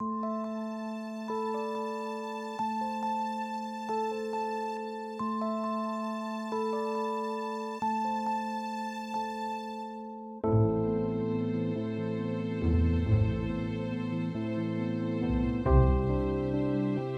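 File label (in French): Tristesse, les émotions; Tristesse 2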